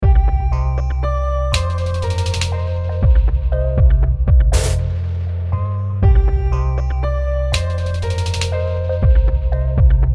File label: dream music